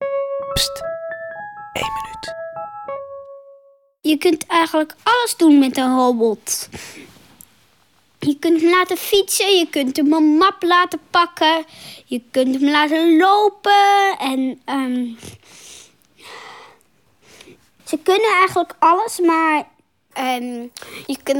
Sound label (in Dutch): robot